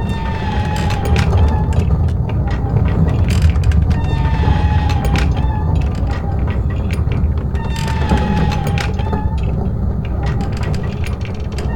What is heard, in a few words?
Wind Mill Loop